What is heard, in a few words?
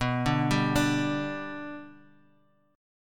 B Minor Sharp 5th B down; arpeggiated; JGuitar